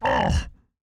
ogre hurt

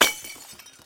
Shatter

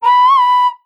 female hit

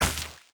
lightning bug zap

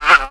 NPC Hit

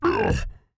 ogre hurt

